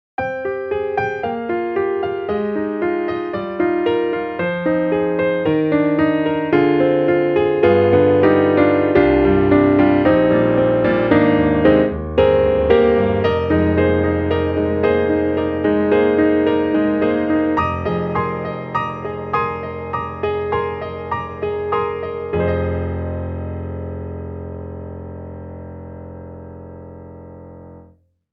Keys Studio Grand Mono Compressed; XLN Audio; Addictive Keys

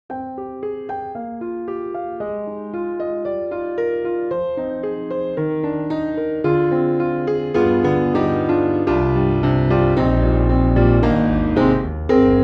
jazz club; Native Instruments

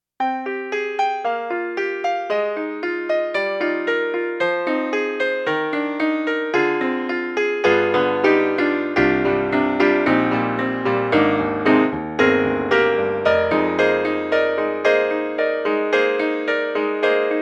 Kurzweil MicroEnsemble ME-1 Dance Piano

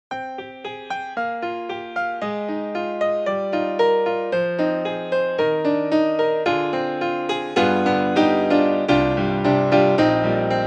Concert Grand Concert Grand; Native Instruments; Vienna Concert Grand

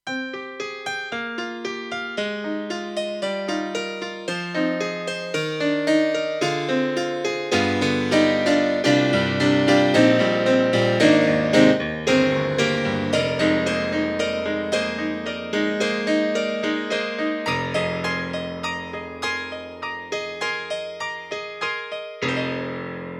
BRIGHT GRAND; AKAI SG01p

